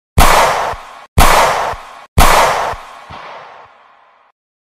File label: Glock, تفنگ